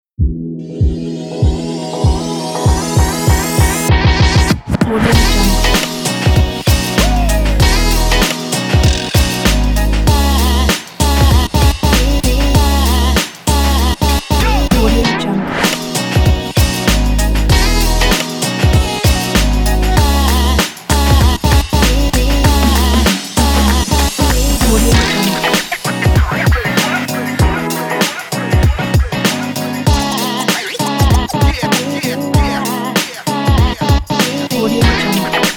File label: hip hop as hip hop